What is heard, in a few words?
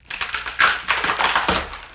Tree Roots Ripping Ou